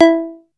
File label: BASS SEQ. E5; SEQ AND PERC; Music Store - Claudius Diemeter Schwerlos